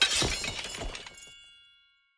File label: Maze Retro Game Place Photo